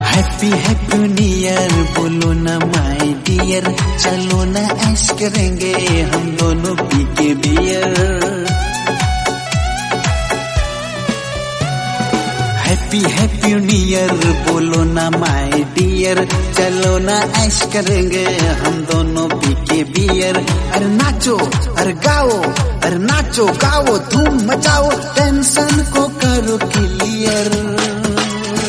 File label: Hindi Song